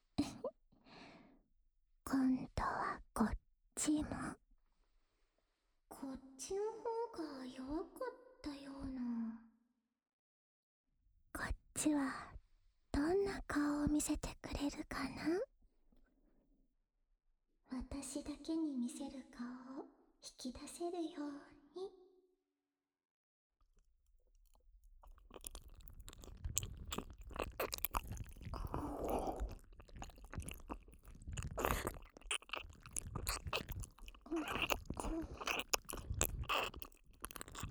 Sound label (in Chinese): 舔耳循环（右）